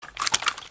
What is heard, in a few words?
wpn gbl plr inspect settle; viper